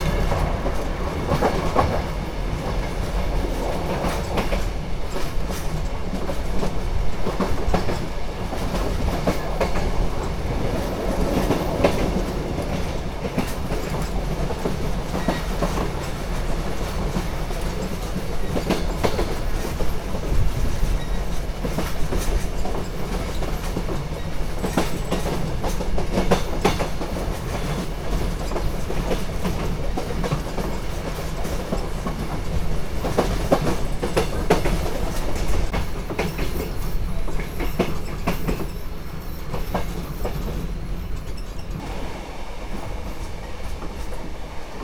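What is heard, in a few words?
train, exterior; ENV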